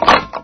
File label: lever on